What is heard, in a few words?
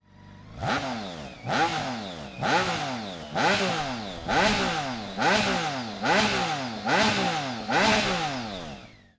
BMW K1600GT Stock